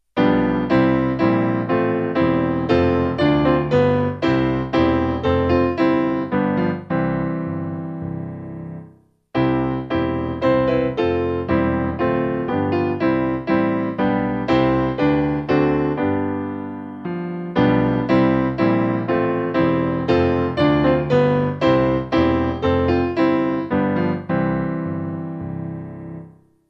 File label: Piano